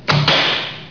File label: spear sound; ambience